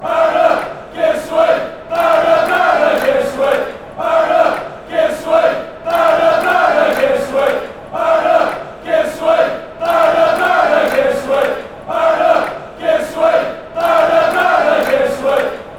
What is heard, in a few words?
give us a wave, chants